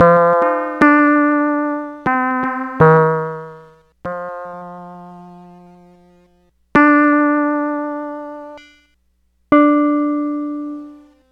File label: pss-140 switches on